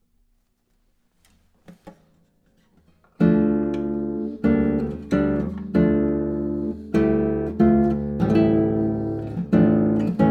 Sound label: guitar